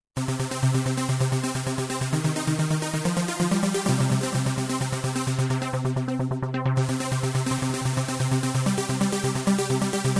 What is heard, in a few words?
Dance Xpanded Arps; MOTIF